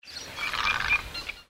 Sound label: great egret